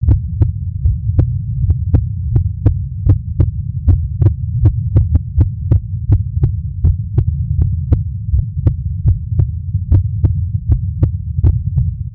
real heart beat

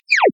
zip

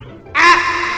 aah

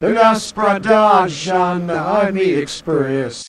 singing; us presidents

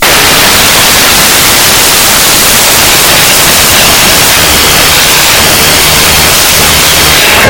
warning siren; soundbible

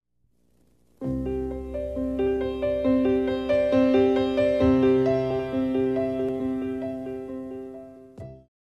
bg music

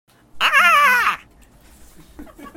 aaahhhhh

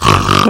cocaine snort

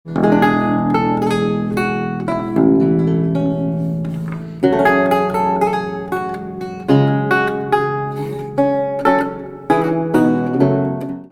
lute

